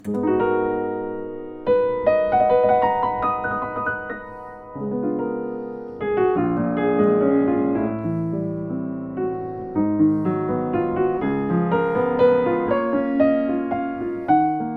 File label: Yamaha U1 H, piano